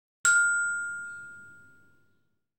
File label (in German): glockenspiel (c-dur-tonleiter) pro ton ca. 3 sec.